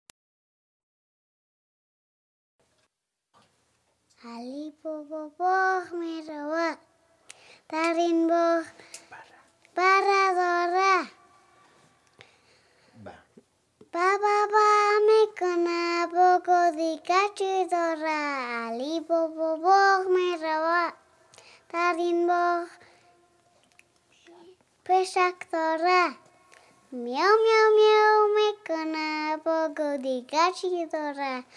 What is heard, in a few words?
Sung By 3 Years Old